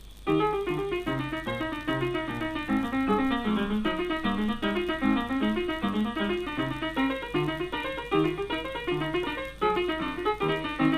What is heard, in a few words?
toccata and fugue in e-flat major; lunar